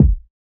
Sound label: kick dry grimey